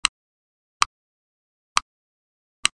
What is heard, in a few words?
Video game controller buttons